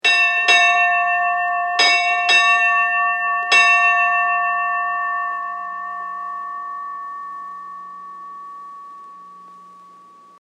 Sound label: Ship Bell 4 Rings; Ship Bell 5 Rings; Boats; Vehicles